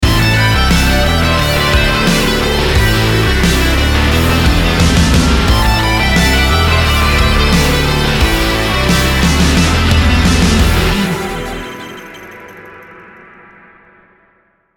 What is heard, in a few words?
rock